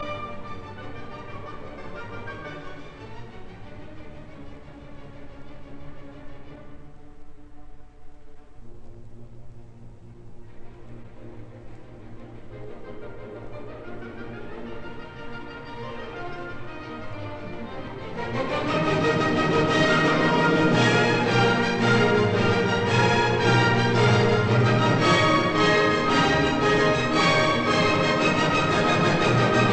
Symphony in E flat major Scherzo Allegro vivace Trio; Op 55; Eroica; Bruno Walter